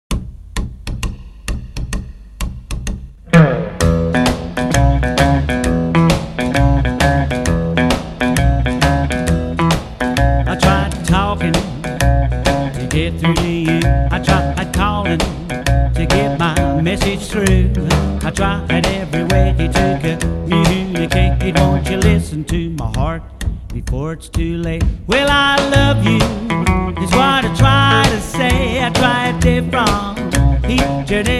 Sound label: ROCKAB, rockabilly; Rock’n’Roll